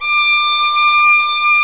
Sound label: VL TEN D 5-L, STRNGS